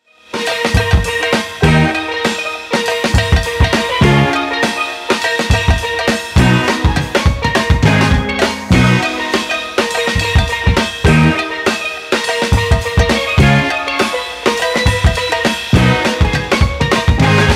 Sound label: Rhythm & Steel Band